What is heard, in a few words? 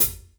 H.H.F, STUDIO STEREO HI HAT